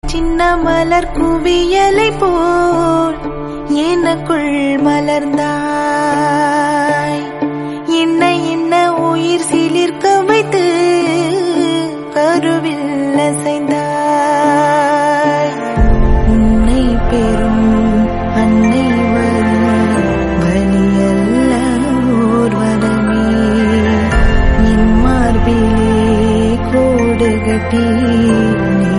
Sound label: tamil song; Aranmanai 3